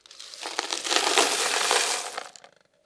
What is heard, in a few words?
Cereals Slow Pouring